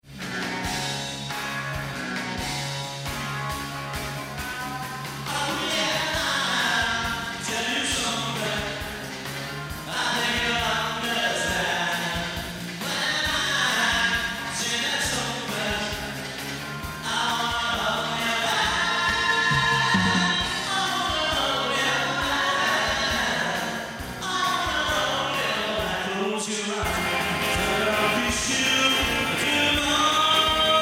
Tribute Band

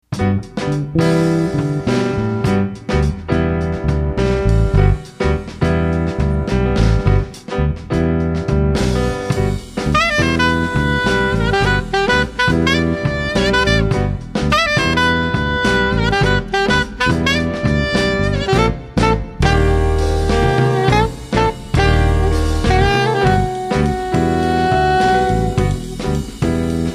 Jazz Band; Boston

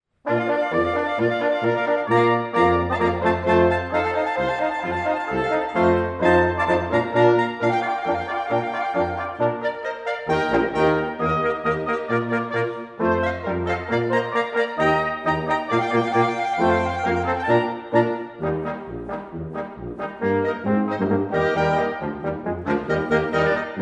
German Band; Detroit

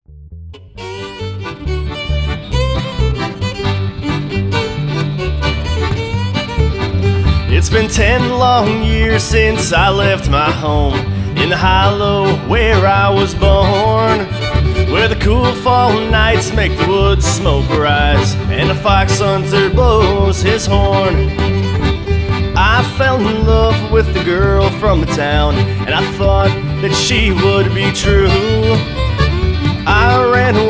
Irish Band

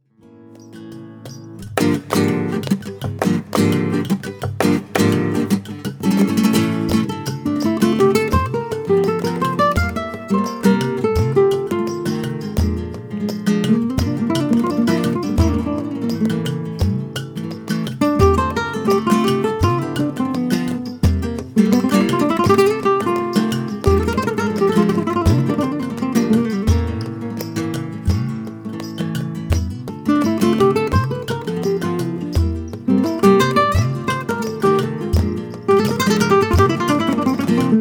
Guitarist, Tangos; Santa Barbara Guitarist

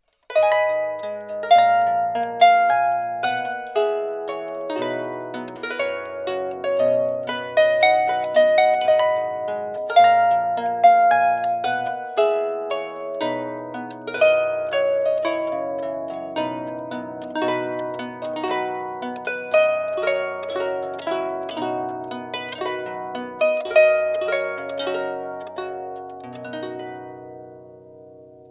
Harpist Wedding March; Orlando Harpist

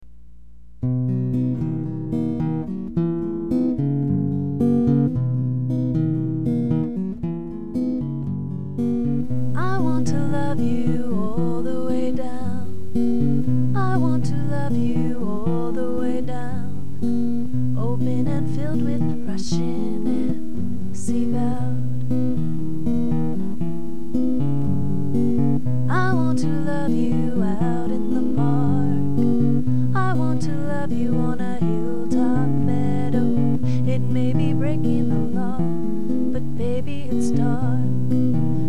Singer; Fort Collins Singer

